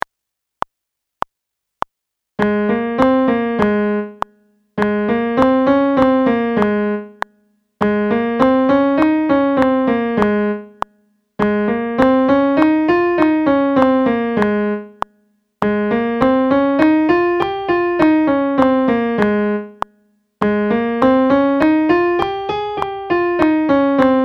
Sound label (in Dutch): Toonladder G# oefening piano